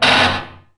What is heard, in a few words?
METL PLAT, Anime Sound FX; COMIC